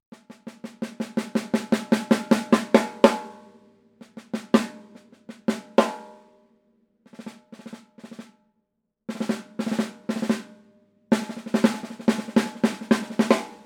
Liberty Mahogany, Slingerland

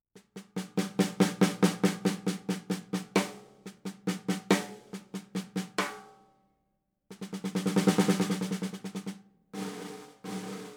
5x14 Lud Acro 70s; Ludwig

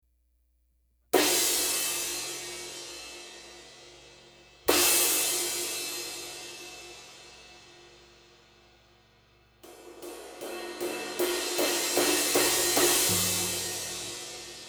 Istanbul 22 Flat

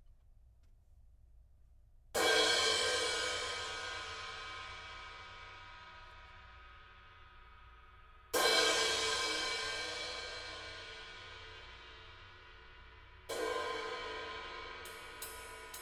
Zildjian K Custom 18 Ride

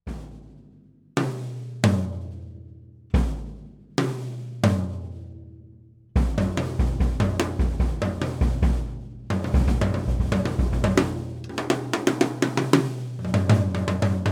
Ludwig RS 12 14 20

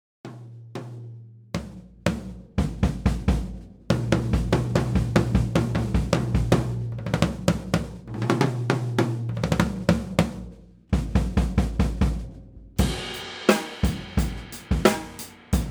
Ludwig WMP 13 16 20

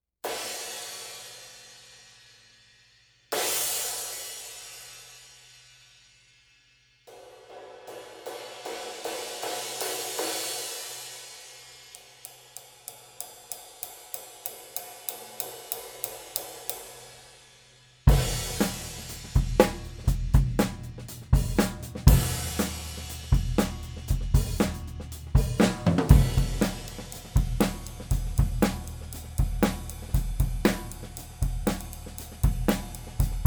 20 K Flat; Zildjian

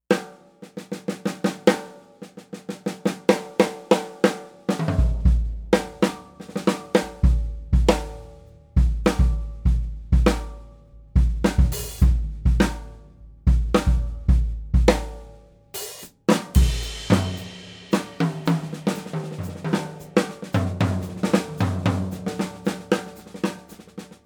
Gretsch 6514 Brooklyn HAMMERED COB

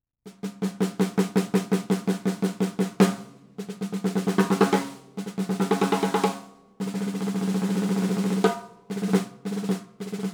tama

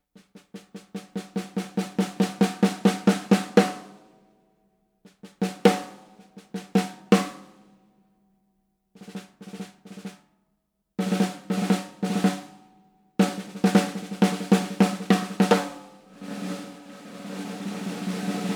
65x14 Radio King, Slingerland Maple

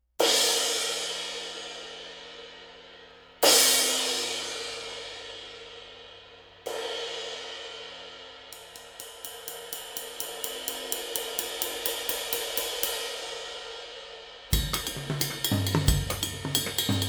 Crescent 22 Hammertone

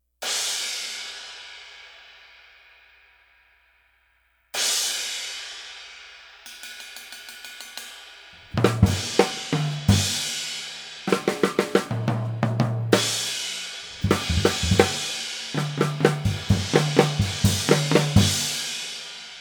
18 Sabian Ozone

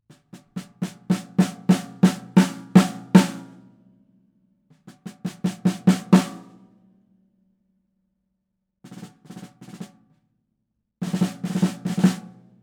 Ludwig Super Sensitive 70s

